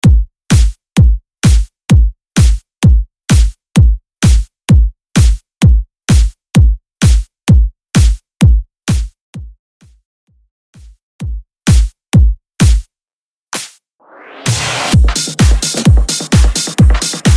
EDM 128 Bpm; Mainstream VIP; Vegas Edit

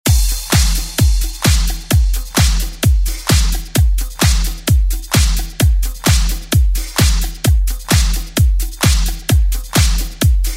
80s ReDrum; DJ Mhark

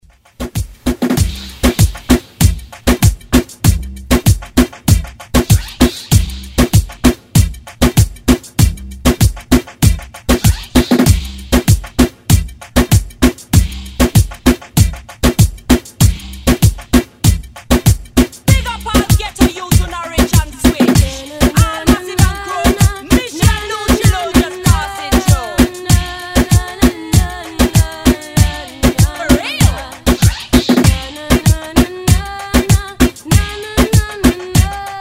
Reggaeton Redrum

